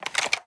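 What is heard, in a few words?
Inspect Movement; Valorant; shorty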